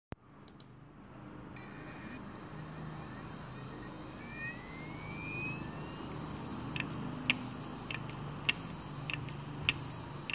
Heads surface seagate, damaged hard drive sounds

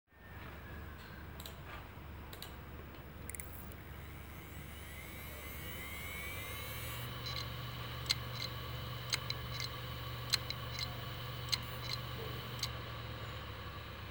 HEADS 500GB 3.5, damaged hard drive sounds; TAHOE XL

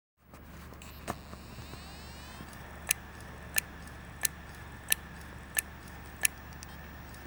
Heads wd 2.5 1 TB, damaged hard drive sounds

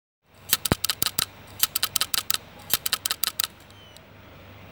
Heads toshiba 2.5, damaged hard drive sounds

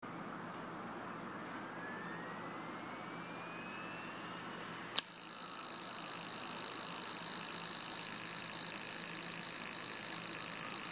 WD heads, damaged hard drive sounds

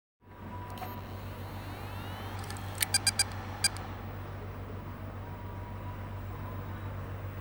HEADS WD 2.5 1TB, damaged hard drive sounds; FIREBIRD

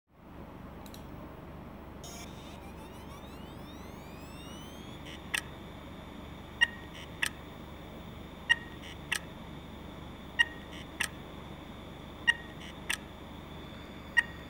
HEADS HGST 3.5 500GB, damaged hard drive sounds; HDSLA362

